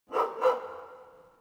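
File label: village dog, colombia; ambience; Soldier Of Fortune II Double Helix; XBOX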